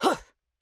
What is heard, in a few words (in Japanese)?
攻撃